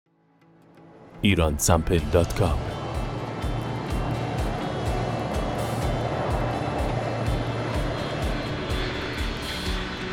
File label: airplane; Iransample